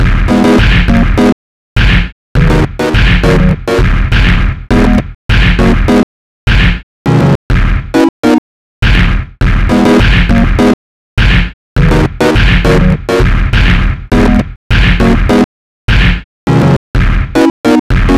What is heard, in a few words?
SINE WAVE SONG